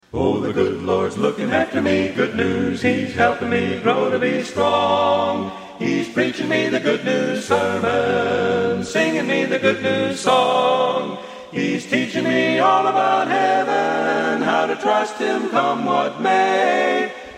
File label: Good News Tenor